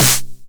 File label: 909 SD Tape Sat